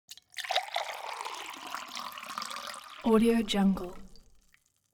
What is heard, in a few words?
elements water glass pour hard; smartsoundfx